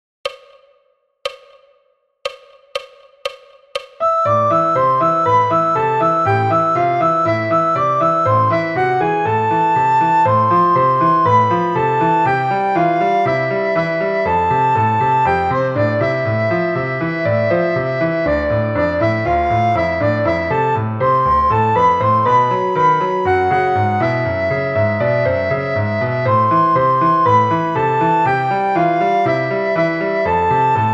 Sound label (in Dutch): sopraan snel